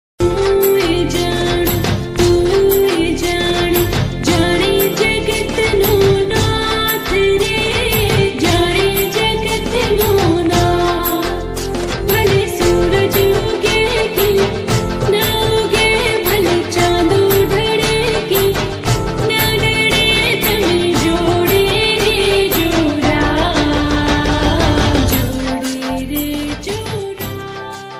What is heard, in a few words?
gujarati love cute ringtone